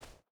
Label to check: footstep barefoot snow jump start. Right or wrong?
Right